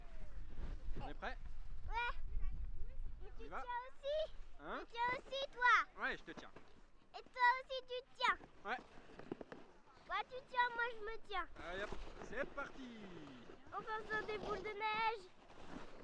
luge

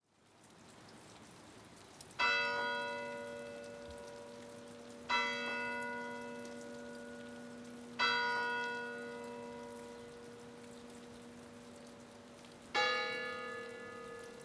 cloches; cloches voivres

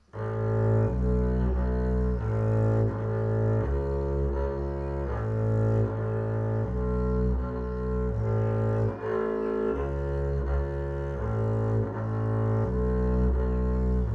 contrebasse

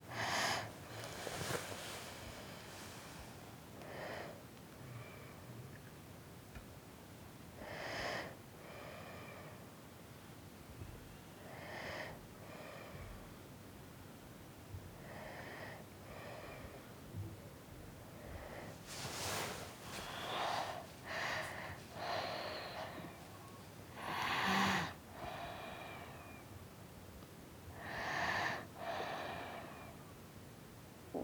respiration

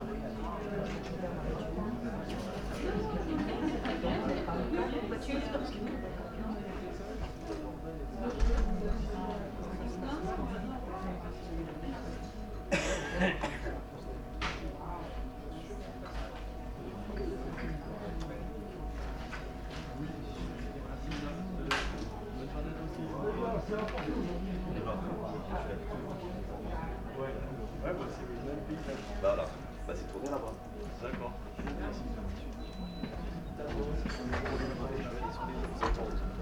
cafete

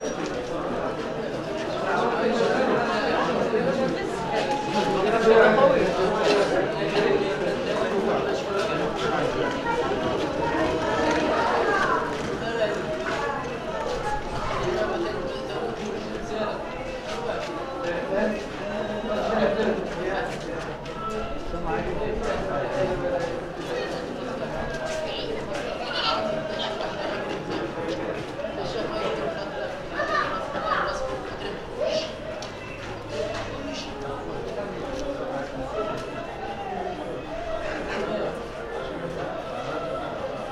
essaouira porche

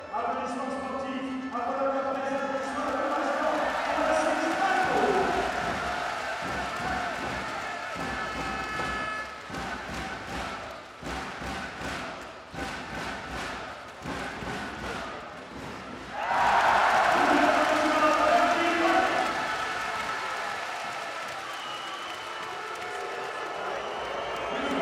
basket

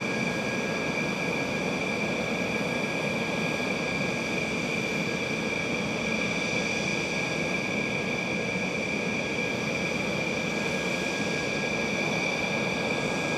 rafale

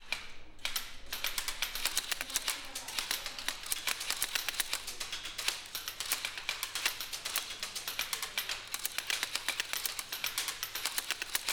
sculpture

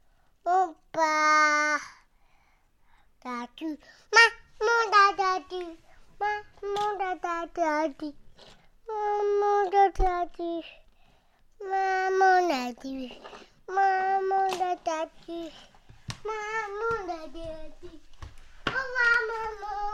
tortue

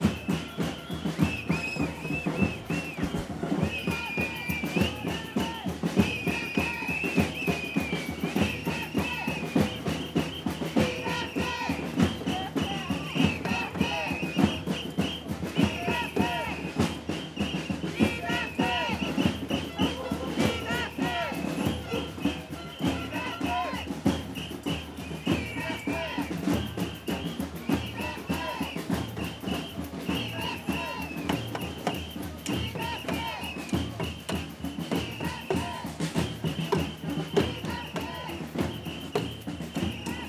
manif retraites

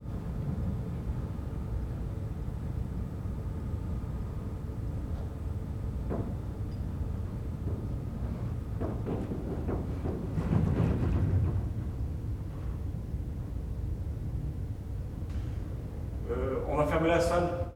fermeture opera

